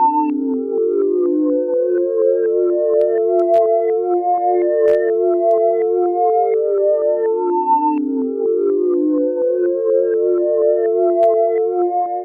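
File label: REVERSE SEQUENCE, AMBIENT PAD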